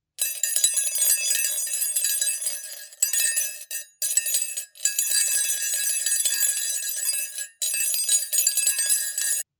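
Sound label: sound spoon in glass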